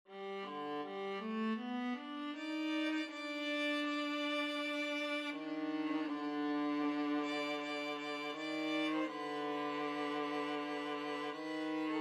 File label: violin viola duet